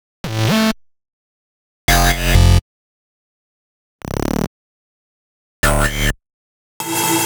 128BPM Cuts SC; Query; Vengeance Samples; Query Kit

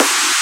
Claps; Vengeance essential club sounds-3

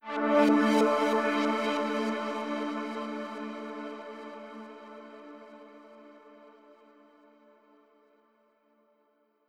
Atmo D; Vengeance Samples; Bonus Sounds

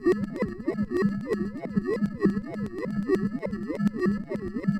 Roland C Noise, Roland S100

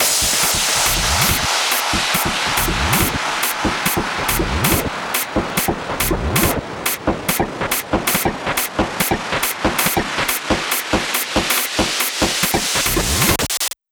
140BPM FX 8 Bars; Vengeance Samples; Snares&FX Pairs

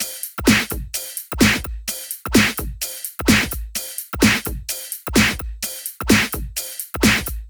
128BPM Flatbeat Kit

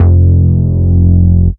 Root F, Bass

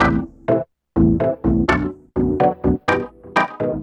bse, organ loops; house; Vocal House; PS-28 Vocal House Samples; instrumentloops

